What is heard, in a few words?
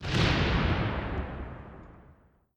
tiles flying; Skillzgaming; gems odyssey desktop